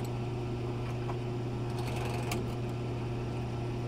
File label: fuel fill up; gmod; svmod